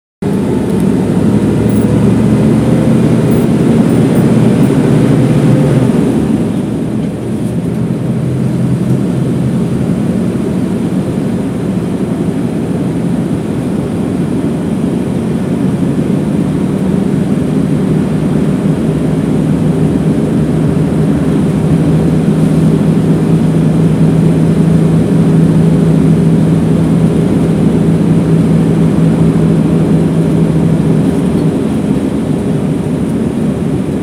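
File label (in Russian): звук маршрутки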